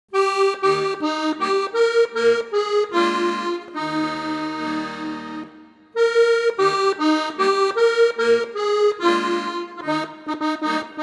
inst; auf einem baum ein kuckuck sass

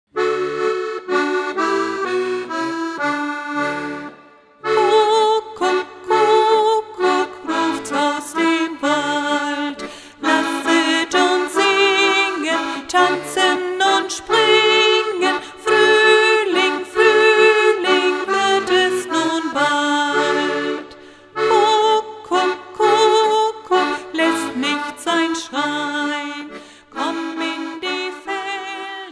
kuckuck kuckuck rufts aus dem wald

